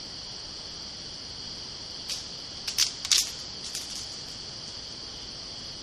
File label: crackling sticks